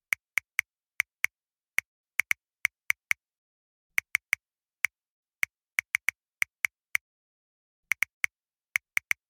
Smartphone texting

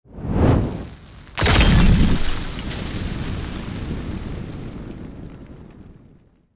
Molotov throw glass break and fire; war

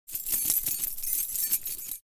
Bunch of keys handling and jingling

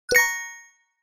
Bright pop up

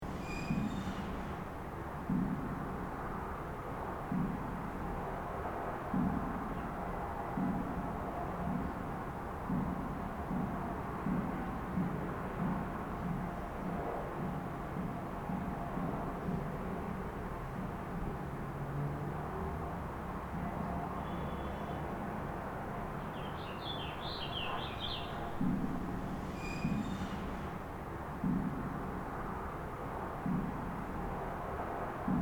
Night playground light wind equipment rattle

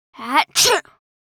Teen girl sneezing

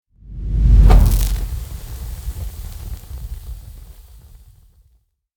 Fire whoosh transition; cinematic

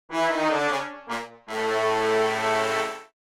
Mobile game epic fail lose

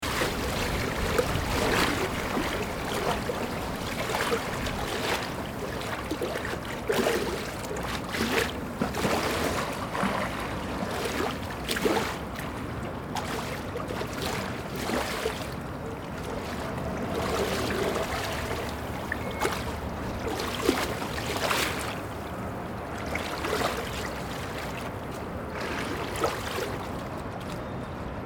Boat ambience fishing small waves loopable

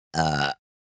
Human burping short and deep

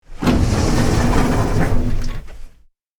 Train door opening; vehicle

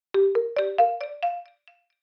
Alert notification delay

